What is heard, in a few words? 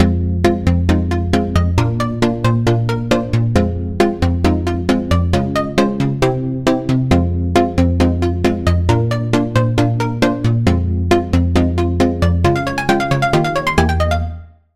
Key